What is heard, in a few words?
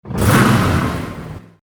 Fairlane Rev; Andretti; Cars